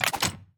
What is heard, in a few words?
Shop Update; mighty party 2017 gamerip pc ios android